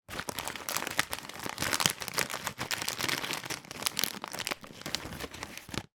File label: food crisp potato chip packet hand rummaging inside; zapsplat